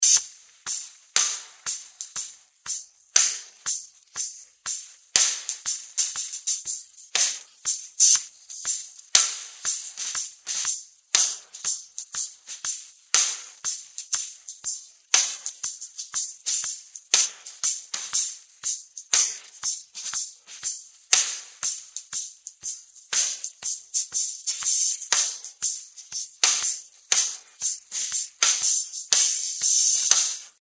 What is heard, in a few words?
Modern World studio (Hihats)